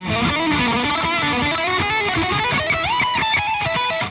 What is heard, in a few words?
shred